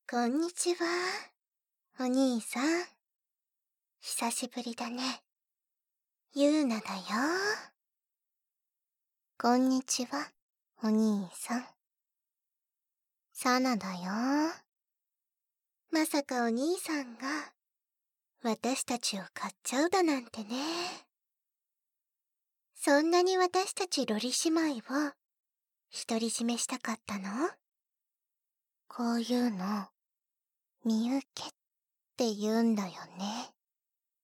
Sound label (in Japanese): 耳舐め, ASMR; 褒められながら耳舐め→射精後のよしよし耳舐め